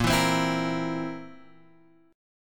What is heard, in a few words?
A sharp Suspended 2nd Flat 5th A sharp, Guitar Open G